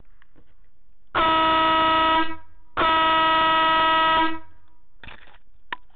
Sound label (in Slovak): klakson 12v podtlaková fanfára so stálym tónom